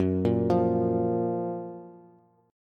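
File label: G flat Major 7th G flat Bass; GbM7 arpeggiated; JGuitar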